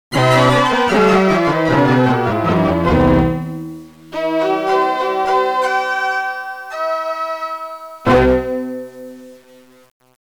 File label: MUSAK; The Treasure Hunt